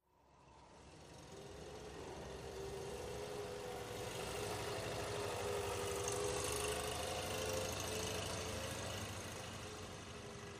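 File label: 3 Wheel Scooter By Slow; 3 wheeled scooter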